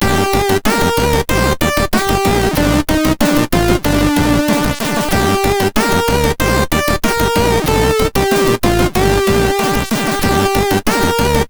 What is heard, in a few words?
Power sid MOS6581R3